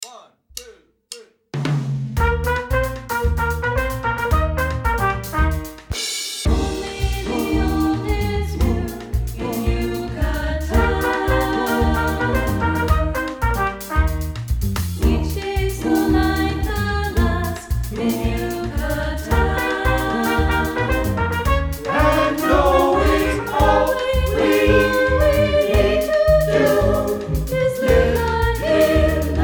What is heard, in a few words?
samba